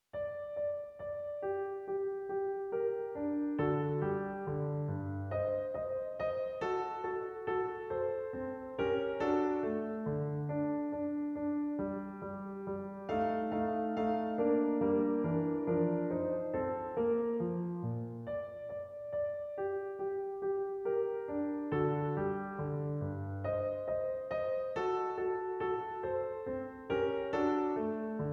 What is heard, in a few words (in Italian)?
Minuetto e trio